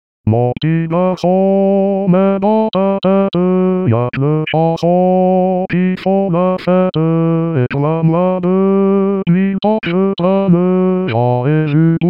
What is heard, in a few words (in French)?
voix homme; mon ptit garçon